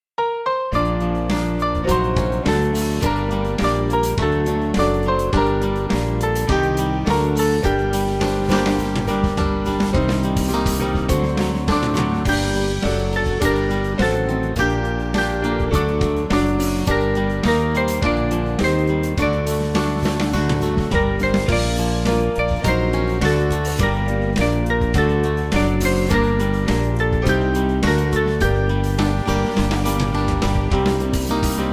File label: From Thee All Skill, Band; Farnham